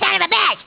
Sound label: sob